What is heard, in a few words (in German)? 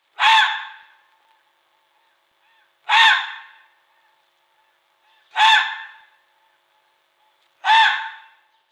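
Rotfuchs Vulpes vulpes Rüde Bellserie; Guenter Tembrock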